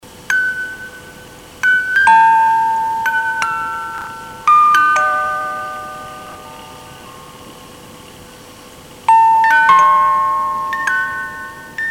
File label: dying music box